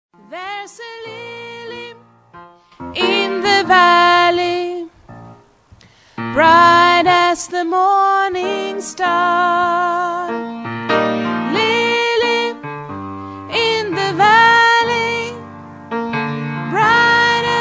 sopran, stammor

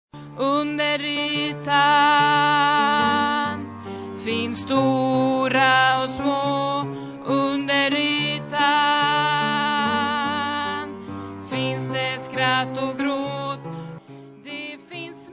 bas, stammor

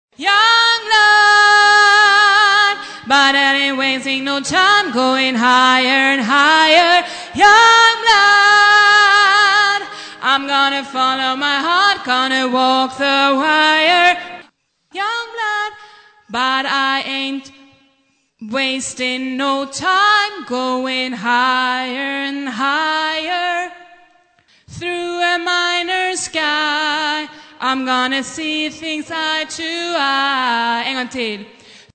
sopran, stämmor

